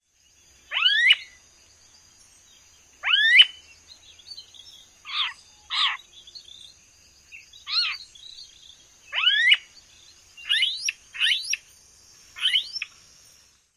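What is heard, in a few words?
calls, Smooth-billed Ani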